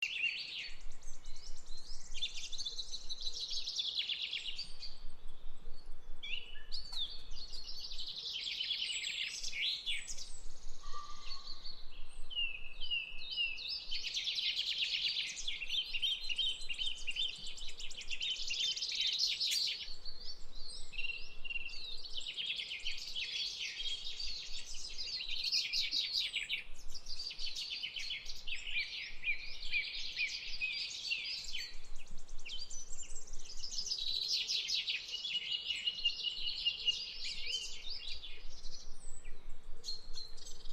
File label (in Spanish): Naturaleza y Sonidos de Pajaros